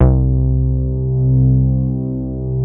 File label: C3, BASS; Q Up Arts; Dream Experience; BASS BANK